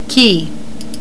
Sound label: KEY